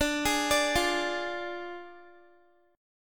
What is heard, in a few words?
D Diminished, Guitar; arpeggiated; x,17,20,19,17,x; 4F,4A,5C,5G,5C,6E